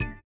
VIBRA; Spectrasonics; Metamorphosis

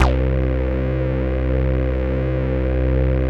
CS-15, BASSES

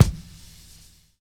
BRUSHING; Univers Sons

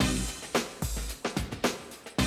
drum loop, 100 BPM

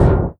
WHITE STOM, PERC; NorthStar - The Wizard VOL-2; TSAR PERC

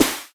SD HOU, Dance Mega Drums; Best Service

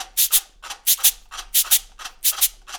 Roots Reggae; Sounds Good - Roots Reggae

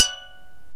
STEEL DRUM

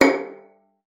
VIOLIN

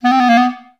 B2, orchestra; Best Service; Peter Siedlaczek's Advanced Orchestra

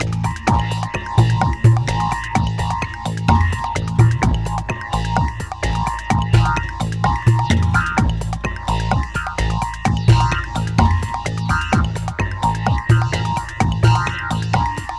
Elektro, Processed Percussion

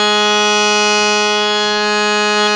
HARMONIUM, World Instruments

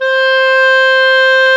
Clarinet; Roland LCDP04; 90 s Sample CDs; Clar Cls

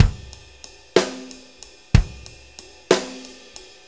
6-8 Loop, Full Kit Loops; Drum Tools

